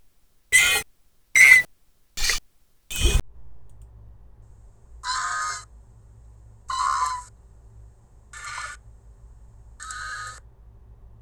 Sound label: squeal